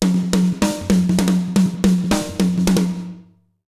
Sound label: BEAT, Jazz Latin Drumloops; USB Soundscan vol.08; AKAI